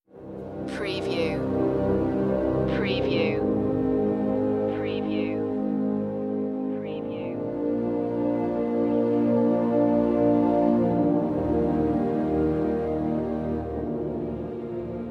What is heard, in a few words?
strings only